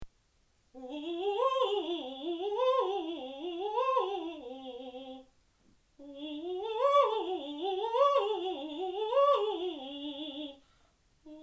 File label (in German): Arpeggien, Beispiel